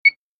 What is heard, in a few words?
Beep Single High Pit